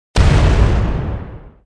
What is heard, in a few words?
Warstomp; war3source